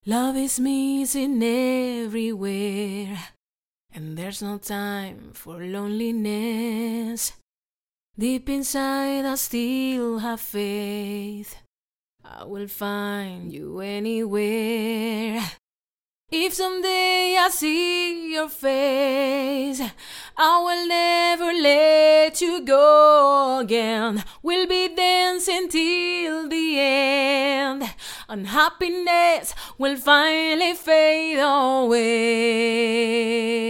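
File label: pop 60 B flat minor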